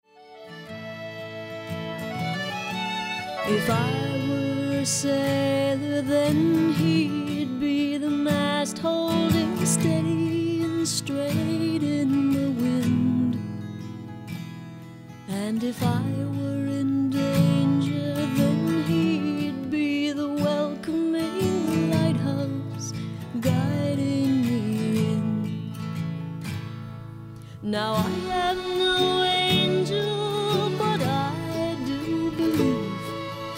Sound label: Caribbean Waltz